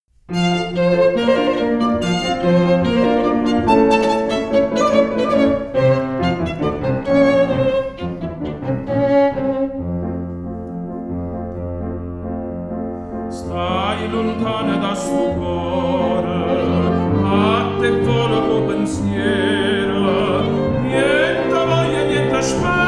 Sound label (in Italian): duetto